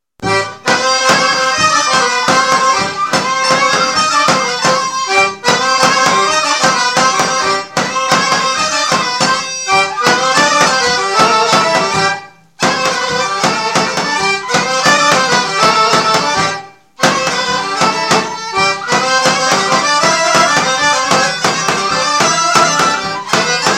Tropanka Dobrudjanski Folk Dancing

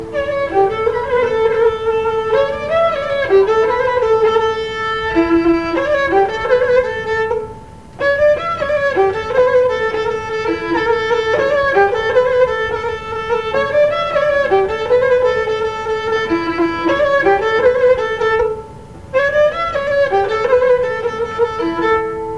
Pandalash line#2, Gadulka; Lesson Recordings; Nikolay Kolev - Gadulka Lessons

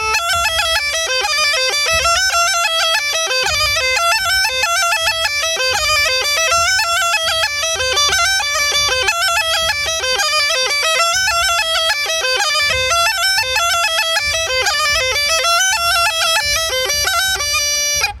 Chetvorno Line 2 Full Speed, Small Gajda

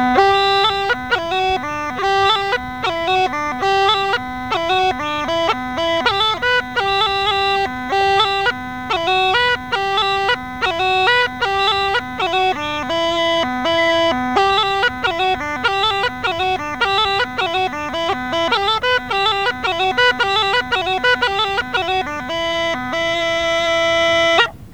break slowed down, Rodope Gajada; Lesson Recordings; Vasil - Rodope Gajada Lessons

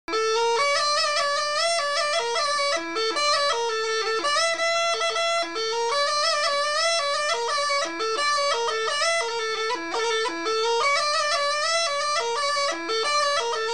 Ruchenitsa Y Line#1, Gajda; Gajda Lesson

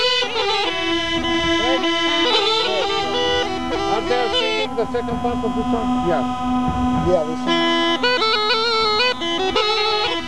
Rodope Gajada

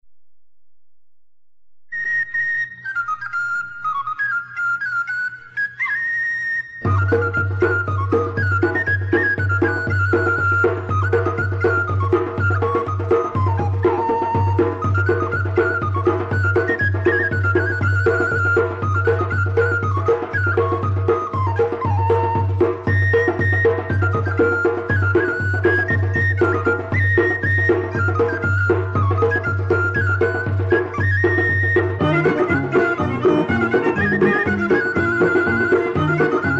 Israel Debka Ha Lachat, Folk Dances; Petaluma; 2nd String Folk Dances; Israeli

